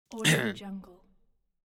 clearing throat male; human sounds; صاف کردن گلو

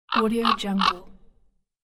raven in flight close crowing

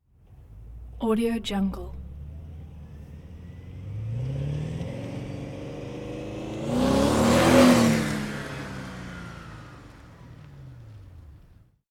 german sports car reverses fast; game; رد شدن سریع ماشین

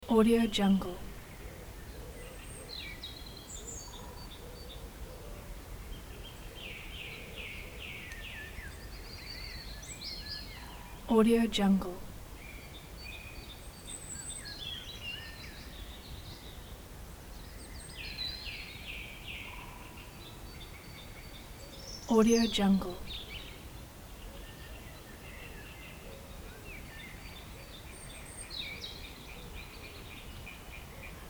forest birds distant afternoon; پرندگان در جنگل از فاص; nature sounds

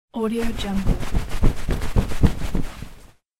drying using towel; domestic sounds

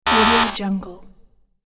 wrong answer buzzer notification

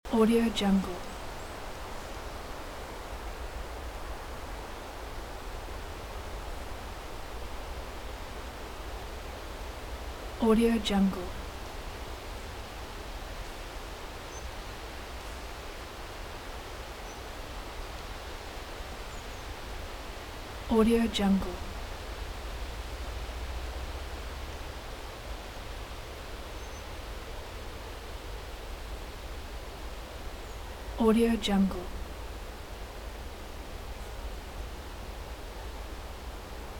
humming windy woods at late summer loop; آمبیانس جنگل با باد مل; nature sounds

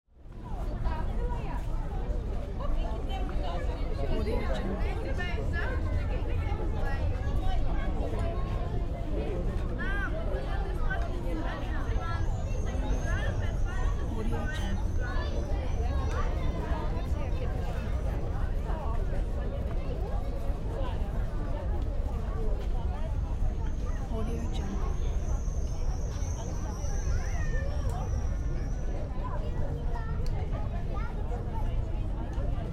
krakow tourists crowd near the wawel castle; urban sounds

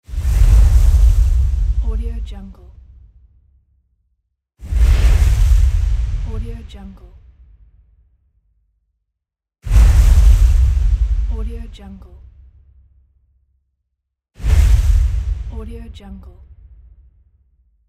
sub whooshes; transitions and movement

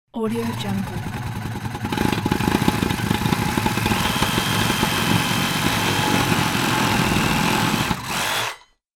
cutting hollow bricks using saber saw; industrial sounds

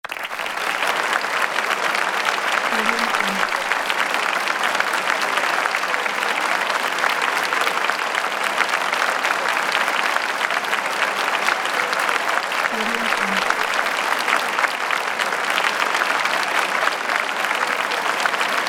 theater applause; تشویق طولانی در سالن; human sounds

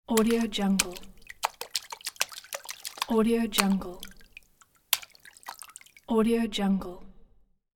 water gentle splashing lapping; آب پاشیدن

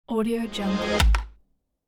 transition snap sudden chord hit; transitions and movement

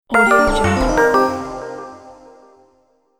loss sound effect

